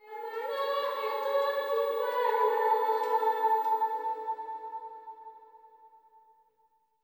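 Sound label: LITTLE GIRL, AFR. GIRL; Spectrasonics; Heart of Africa 2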